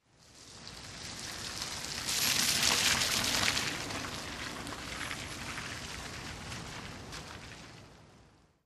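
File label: Car By Slow, close up On Wet Pavement with Engine Off.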